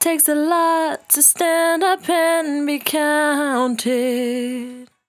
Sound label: 68bpm D, Velvet Vocal; Zero-G